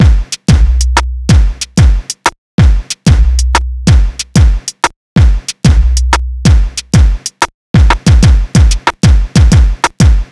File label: Hip Hop Beat, Drums